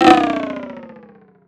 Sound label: Bongo; RnB